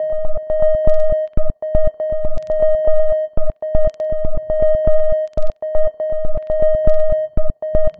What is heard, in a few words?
Cross Hemispheric Pulse; Extra Tweak Grooves